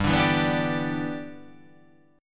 G 6th

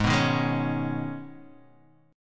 B 6th Add 9th G sharp, guitar chord

